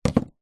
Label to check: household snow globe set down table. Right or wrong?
Right